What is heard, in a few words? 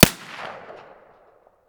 pm muzzle mono far, weapons